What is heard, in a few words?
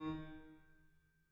harm D#3, Grand Piano; bitKlavier Grand; Piano Bar